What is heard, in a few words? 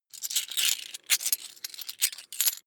coins big search